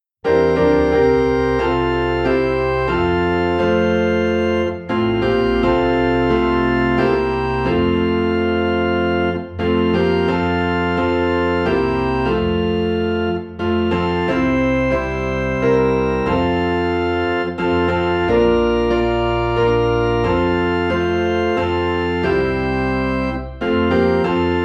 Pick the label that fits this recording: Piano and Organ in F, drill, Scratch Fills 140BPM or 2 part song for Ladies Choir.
Piano and Organ in F